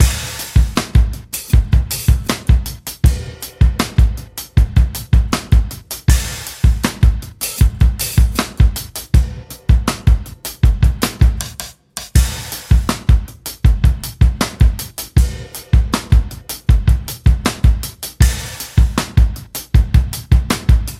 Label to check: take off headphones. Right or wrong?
Wrong